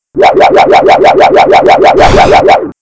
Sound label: spin with direction sign and release; Pinocchio 1995 SNES; gamesoundopedia